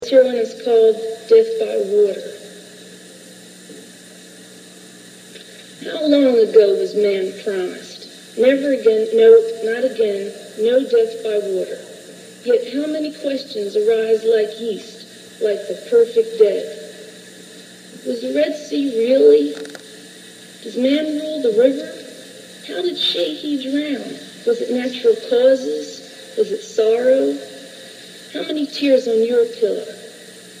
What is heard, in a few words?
Electrified Environment